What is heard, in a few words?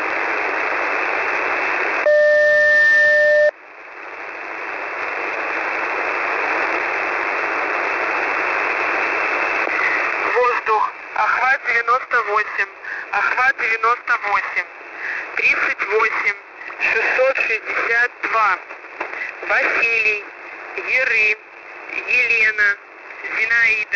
usb msg